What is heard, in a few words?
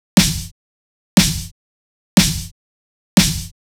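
drum snare, pulse train sound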